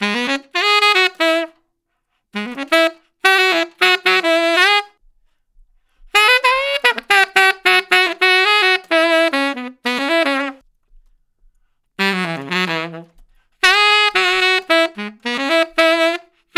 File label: Tenor Solo, Jazz, Traditional, Tenor Sax; Sonic Foundry (Sony Creative Software); Jazz Solos and Sections; Tenor Loops